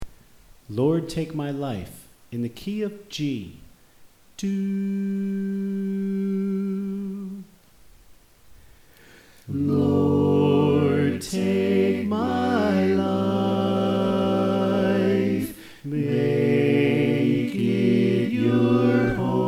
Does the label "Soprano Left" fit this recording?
Yes